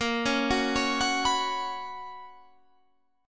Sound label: B Major 7th Suspended 2nd, chord